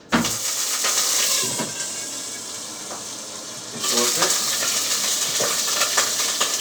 poele a frire en action; Bruitages

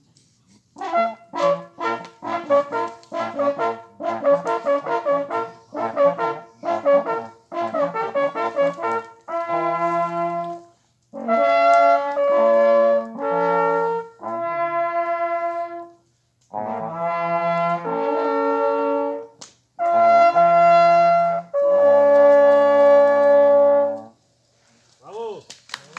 Hommage De Chasse Sonnerie aux Renards; Societe de Chasse de l.Estenbach

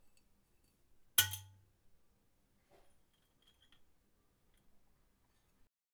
Clic Aigu Bouteille Mécanisme ouverture; Bruitages

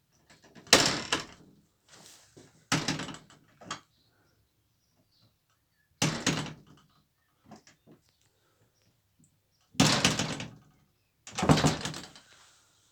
Bruitages Portes se fermant; Percu Bois

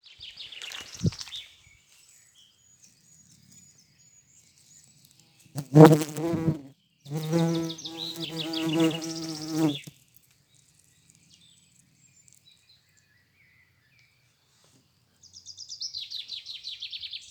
Bourdon tete jaune

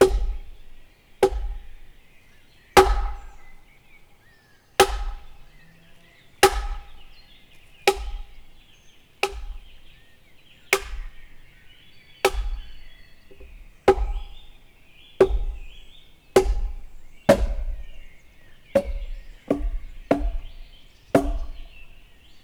Gourdin sur buches un peu plus loin x20; Percu Bois

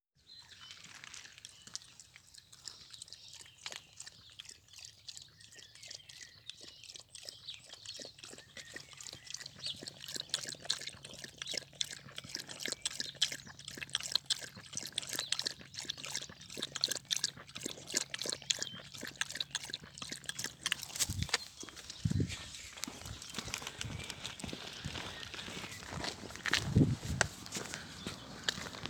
lappements; Bruitages

